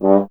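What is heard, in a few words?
brass French horn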